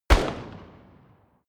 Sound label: rifle distant shot; comedy-cartoon; pistolen-gewehre